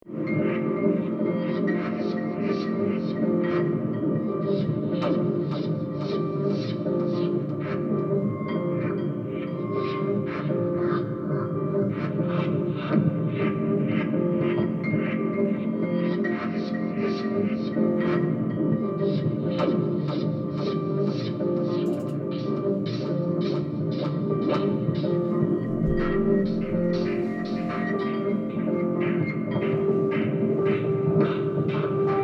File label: 24 keys repeating